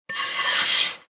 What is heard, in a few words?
make pottery; Immortal